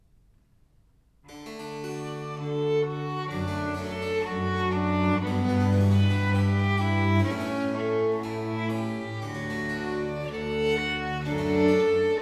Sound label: Sonata in g minor Larghetto; Sonata No. 5; Haendel; Sonate a trio op. 5